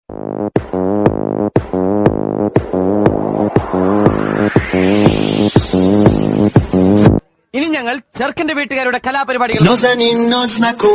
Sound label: tune; free fire